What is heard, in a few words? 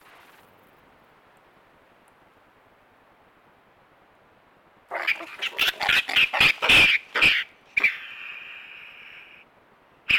Possum Fighting